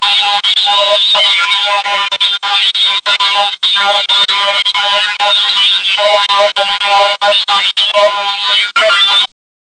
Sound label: a broken robot screaming